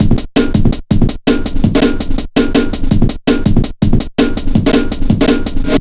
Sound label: 165, Drum Loops, Drum & Bass; Total Drum&Bass